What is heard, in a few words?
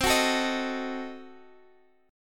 C Diminished 7th; strummed; Cdim7; x,x,10,11,10,11